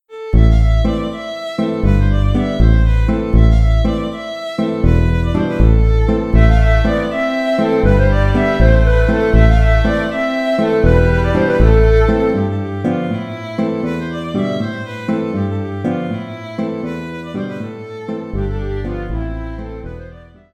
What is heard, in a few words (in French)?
France, Auvergne